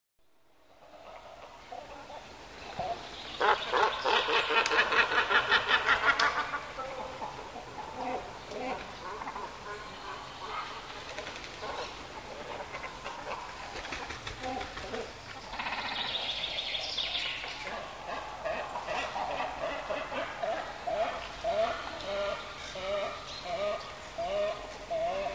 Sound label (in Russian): bolshoy baklan golos